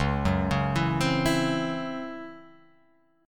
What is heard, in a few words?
C sharp Minor Major 7th Double Flat 5th, chord; C#mM7bb5; arpeggiated; JGuitar